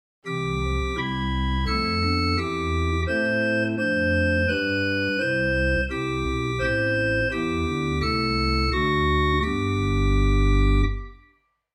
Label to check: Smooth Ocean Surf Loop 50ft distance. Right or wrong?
Wrong